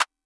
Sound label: clap; MFB-522